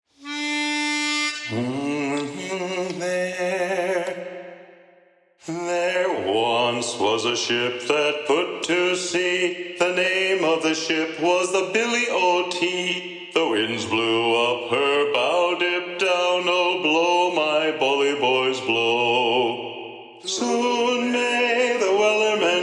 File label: Lead Predominant; sea shanties; Wellerman